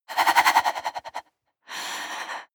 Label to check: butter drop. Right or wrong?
Wrong